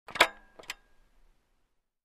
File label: Motorcycle gear pedal